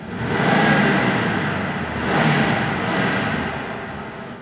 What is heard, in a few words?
van revving garage; Max Payne